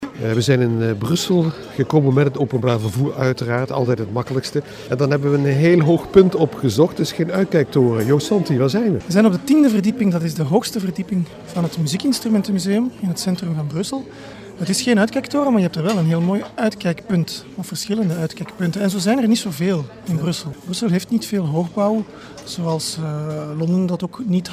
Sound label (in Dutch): Muziek Instrumenten Museum; TAA Brussel